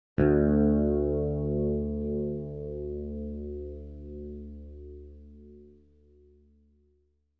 bass D2; KORG - Sound Sampling Collection VOL-5 - Drums & Bass Fusion Funk Effect

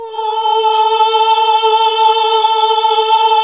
OHH A, Nuns Ooohh; Sound & Vision - Gigapack II CD 1 (Roland); HAL NUNS AAH OOH

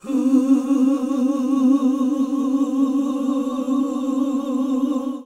OOH C3, GOSPEL; Best Service; Hallelujah; GOSPEL 10

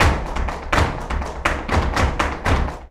BULERIAS TAP, Flamenco; Best Service ProSamples vol.46; AKAI

